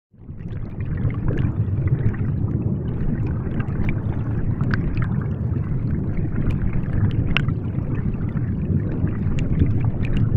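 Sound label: weir recorded with hydrophone; hydophone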